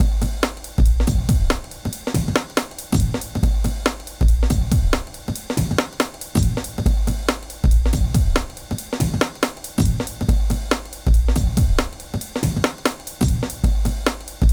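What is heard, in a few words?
drum n bass; Funky Xtreams; INIS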